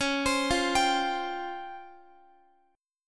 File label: Db Major Sharp 11th Db down Guitar; DbM#11; arpeggiated; tuning=4F,4A,5C,5G,5C,6E